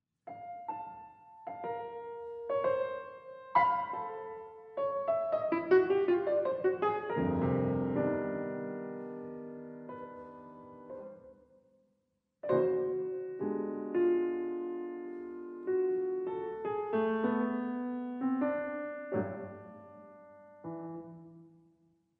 Piano Pieces; Six Little Piano Pieces op. 19 IV

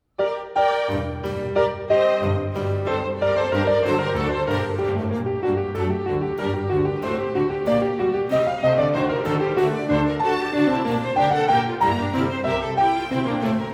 Solo Concertos, Keyboard; Bach; Sondeckis

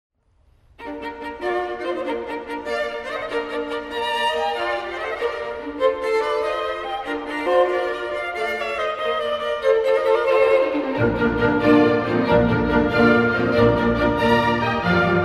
Sinfonia Concertantee; Katkus; Pleyel

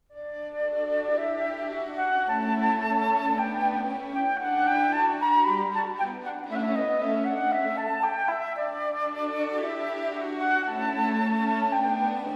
Rondo for Violin and Orchestra; Mozart

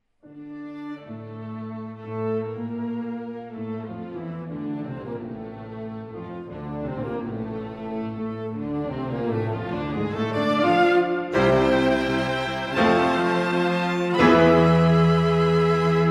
Piano Quintet No. 1; Gernsheim

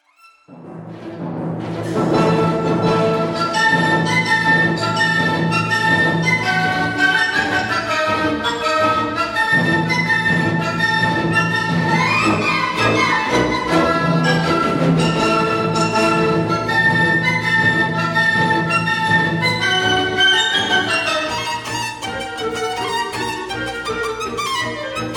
Shostakovich Concerto for violin; Domarkas

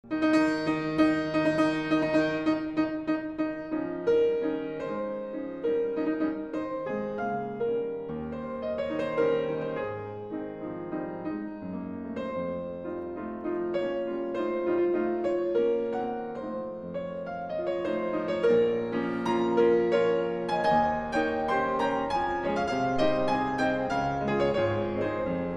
Polonaise; Thunder Baltic

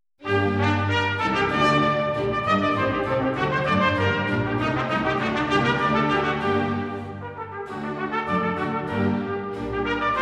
Baroque Concertos; Albinoni-Dokshizer

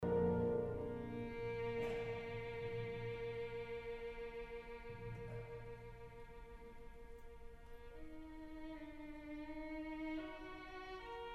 poeme for violin; Dalia Kuznecovaitė; chausson

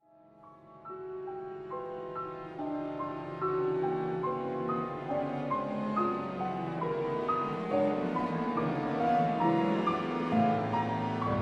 Concerto for Piano and Strings; Schnittke; Sondeckis

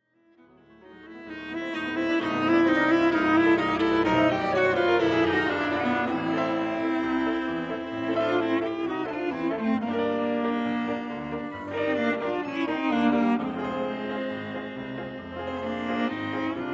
Pasodoble for viola and piano

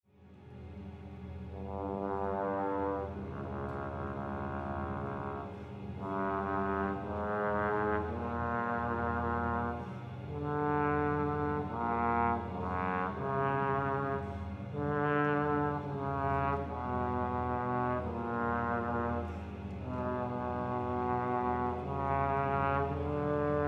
Trombone concerto; Šervenikas; Malcys

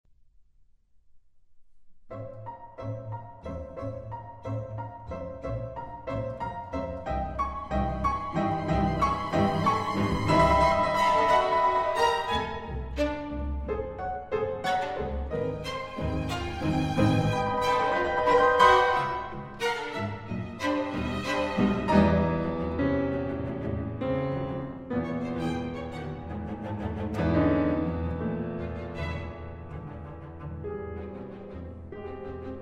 Concertino for Piano and Strings; Balakauskas

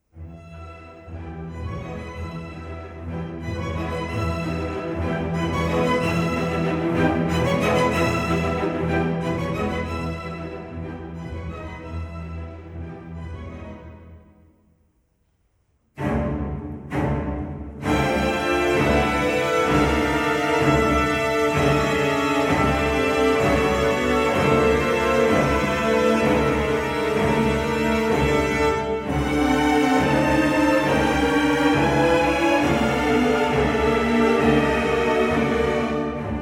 Strings; Werner Pirchner; Sondeckis

